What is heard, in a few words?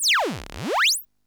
sweep